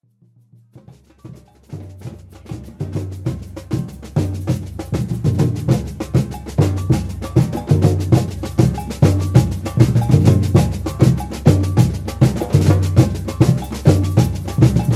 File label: Mambo